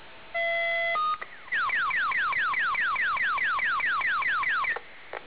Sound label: BFD Tones